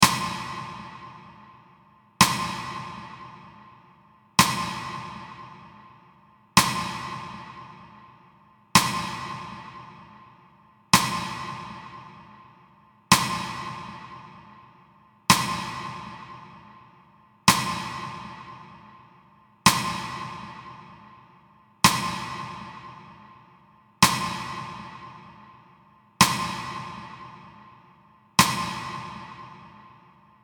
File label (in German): Metronom; Метроном